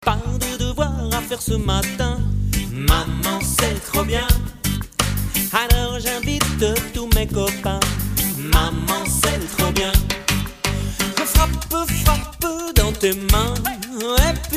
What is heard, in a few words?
reggae; lecon de reggae